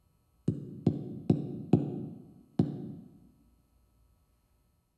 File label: Mic Tap Empty Room Tapping Check; Toronto 2pm